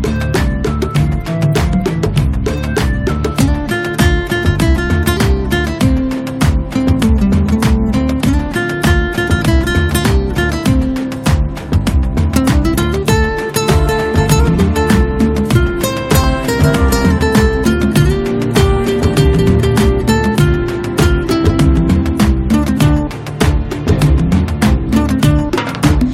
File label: Instrumental Tone